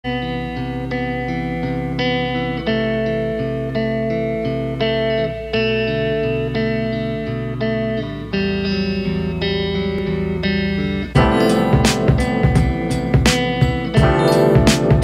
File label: Vinyl Vibes